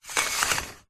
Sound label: household curtains open